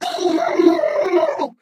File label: ballbot laser burn